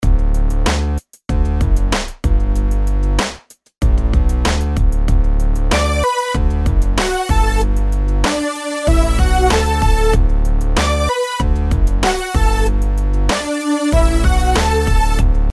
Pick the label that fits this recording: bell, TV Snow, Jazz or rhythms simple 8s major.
rhythms simple 8s major